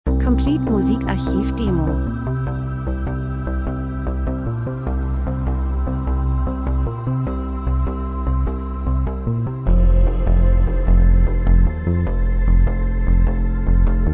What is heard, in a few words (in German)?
drums, rhythmus; Monotoner Bass Rhythmus Wohlgefühl Emotopn Glück Schwerfällig Massiv Menschen Reisen Natur Dokumentationen